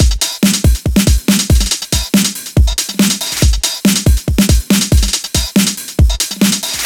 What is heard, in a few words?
Loops BB 140, BreakBeats; Vengeance Samples